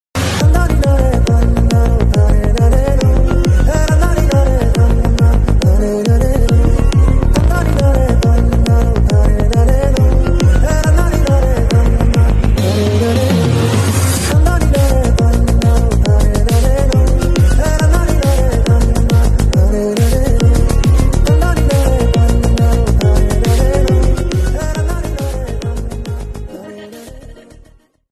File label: Mobile Ringtone